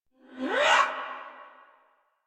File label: Attack Effort; Warframe; Dax; Ranged